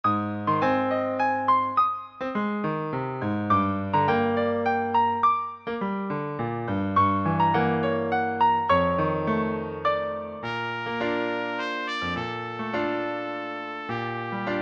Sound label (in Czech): chvilka s trubkou, sólový part pro trubku B, klavírní doprovod; Přednesová skladba pro trubku; Chvilka s trubkou - trubka